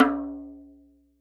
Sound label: tom rim f, hand drums; SoundBytes - Early Patches